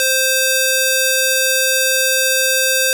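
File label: string C4; fleet keys Samples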